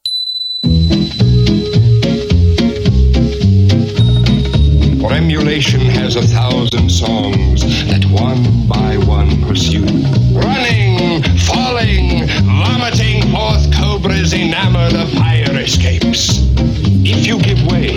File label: Live Intro, Radio Mellotron; Live @ The Rattler